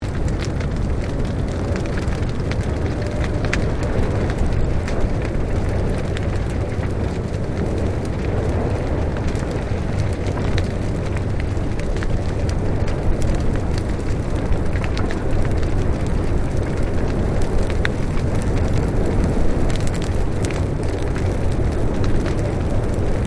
Fire Burning Off Medium Established Close Loop, Controlled fire burn; Flames (Sound Effects:Objects)